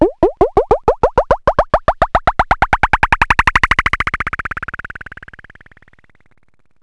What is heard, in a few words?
plas bnce, cartoon; Wired for Sound Pro CD; Aristosoft, Inc.